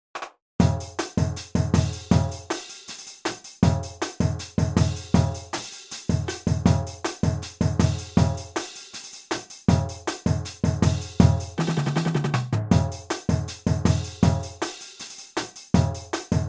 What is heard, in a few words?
stadium groove, brass